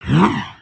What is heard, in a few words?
idle, creatures organic